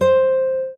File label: Classic Guitar